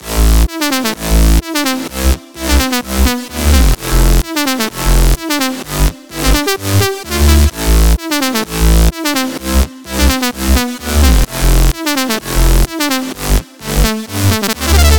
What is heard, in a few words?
128 BPM A Min Sidechained; Cymatics; Drop Loops; Paradise